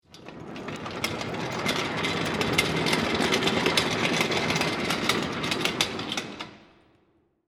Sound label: Cart Roll By, Industry, Cart, Roll, By, In, Garage, Fast; Rolling; BlastWave